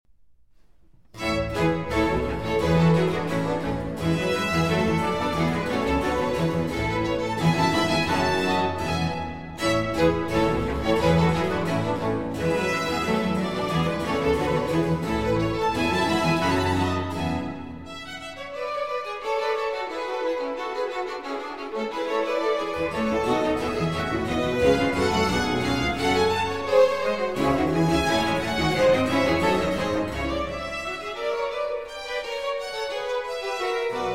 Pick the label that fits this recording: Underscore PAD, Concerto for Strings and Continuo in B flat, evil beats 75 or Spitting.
Concerto for Strings and Continuo in B flat